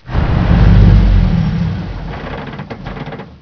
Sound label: truck come to stop